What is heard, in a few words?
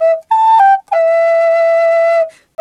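flute, 93 BPM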